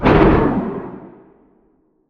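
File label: Assembly Conveyor Stop Distant; Boomtown; Titanfall 2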